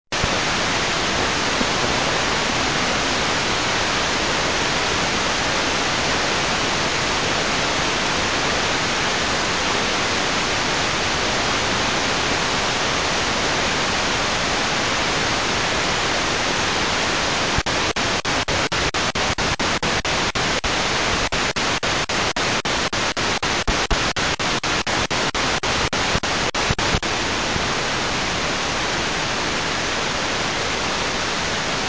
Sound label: 85 110 MHz